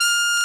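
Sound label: trumpet, cheese trumpet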